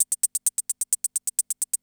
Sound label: CR78